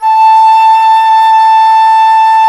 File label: FLT XFLT, Flutes; Club-50 - Foundations Roland